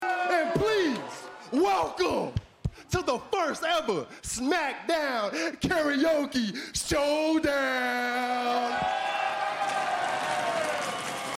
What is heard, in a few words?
karaoke; smackdown karaoke showdown